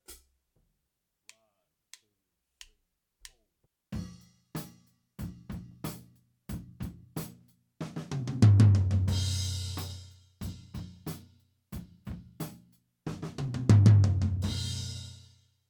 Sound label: Gate Ltom; Drum Recording Setup